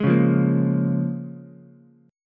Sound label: A sharp Minor 7th A sharp Ukulele, chord; strummed; 1,1,1,1